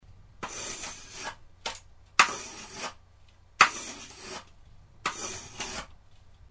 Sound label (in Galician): mezlar a sardiña